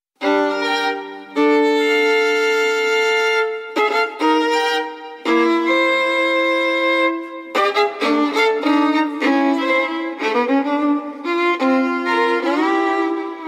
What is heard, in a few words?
Sonata For Solo Violin Tempo Di Ciaccona; Sz. 117